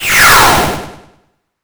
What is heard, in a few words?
Break